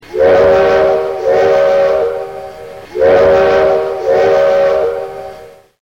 BELL

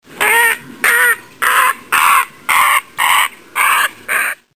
Great Blue Heron

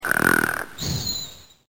snore

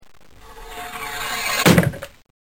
guillotine

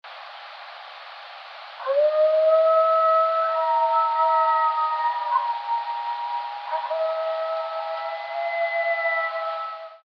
Coyote

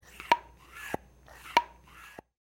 bicycle pump

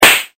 slap

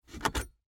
car cigarette lighter out; Stereo

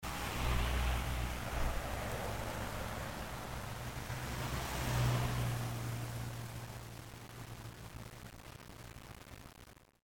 TRAFFIC

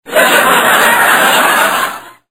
laugh track

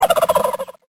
wild turkey

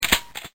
gun cock

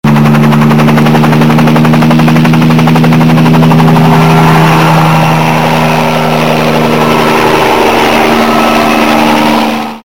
Helicopter

